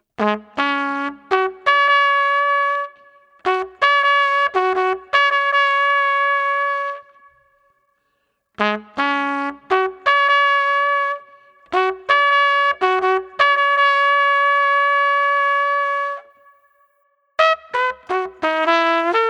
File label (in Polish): Hejnał; Hejnał Rzeszowa